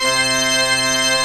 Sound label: PIPE ORGAN